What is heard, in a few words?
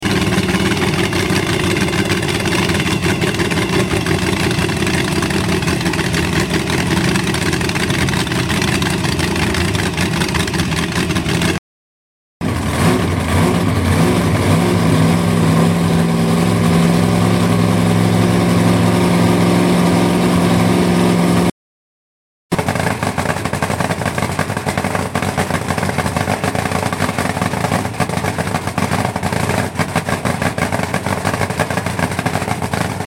Cars Nitro Knockout; street rods; 2022 NSRA Nationals